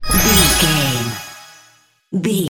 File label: healing magic spell